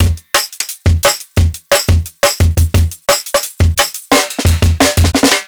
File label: Live Jungle Drum Break, 175; JungleBreaks24